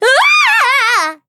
Falling; paladins; Mage

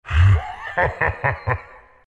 laugh, zh; Chaknight; Dota 2

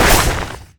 projectile impact; Plague Ward

